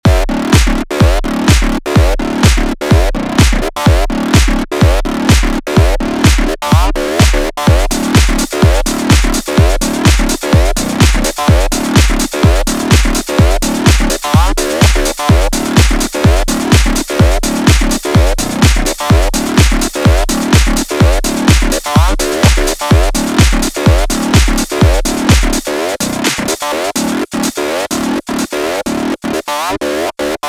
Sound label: bass chorus flex, acid; 100 acid vol 2